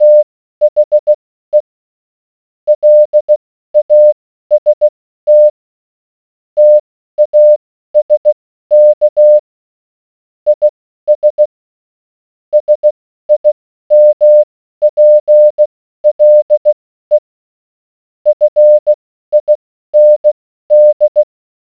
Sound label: morse code; CarolinaCon 2017; crypto challenge